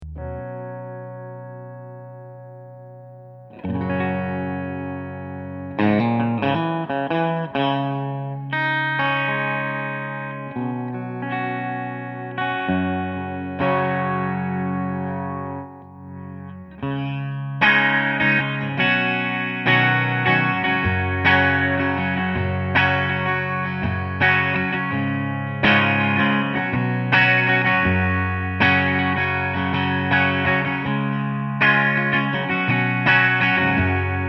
chords, The guitar is a 2001 american series strat with bill lawrence noiseless pickups.